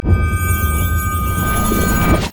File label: light strike buildup; LoL; lux